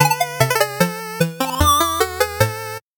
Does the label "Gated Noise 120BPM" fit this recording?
No